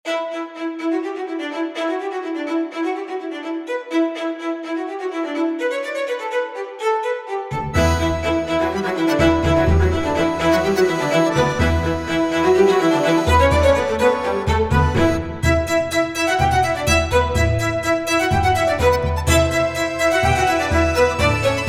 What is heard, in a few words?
Irish Bicycle Race